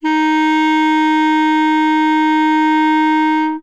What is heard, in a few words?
clarinet; Winds